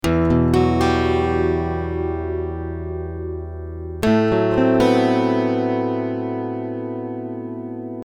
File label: 12-String arpeggios